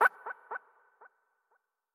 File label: Gigabat Hurt